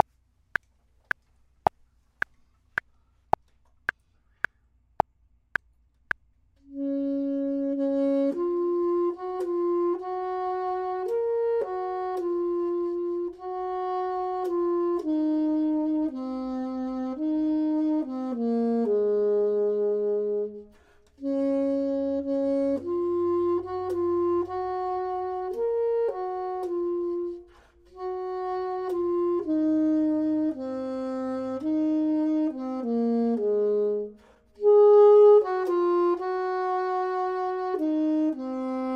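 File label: bottom, Saxophone and Clarinet; voxman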